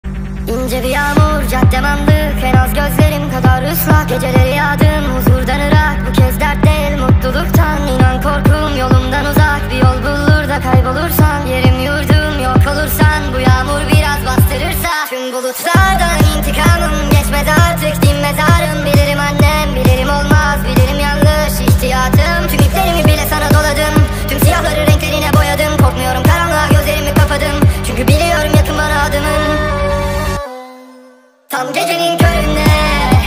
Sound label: speed up